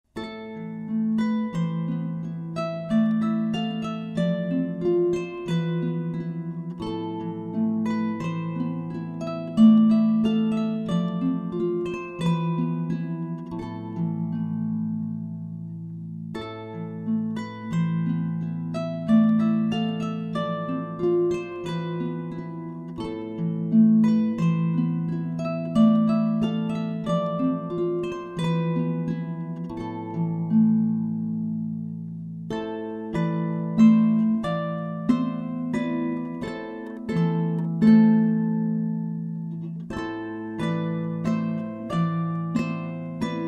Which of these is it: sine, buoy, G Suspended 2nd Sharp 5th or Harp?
Harp